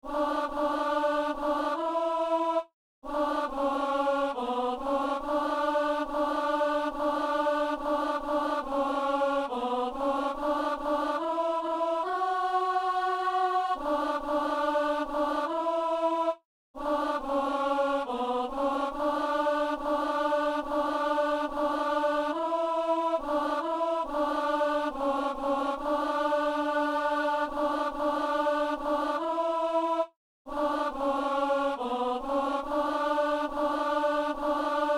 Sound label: alto; Messe du 17 janvier à Saint-Denis